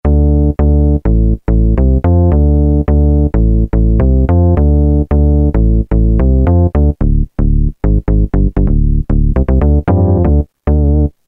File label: Groove Bass; Roland M-DC1